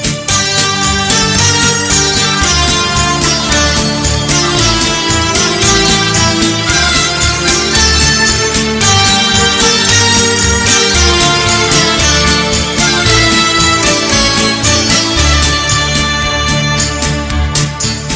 balalaika ringtone

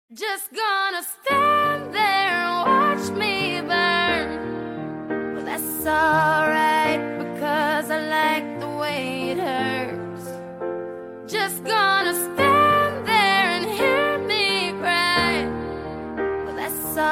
english song

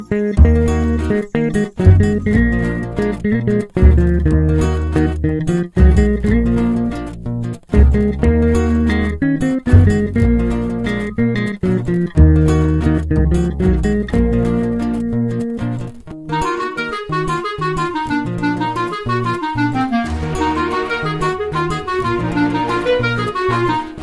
telugu music ringtone

